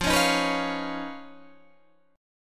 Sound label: D sharp 7th Suspended 2nd Sharp 5th F